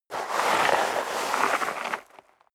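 step dress boots gravel light slide long, footsteps; schritte; menschen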